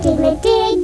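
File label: diglett, Pokemon crys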